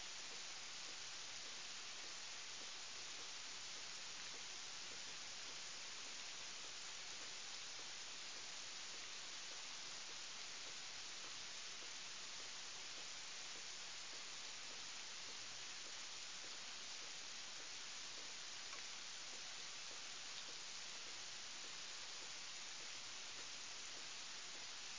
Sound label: Freezing Rain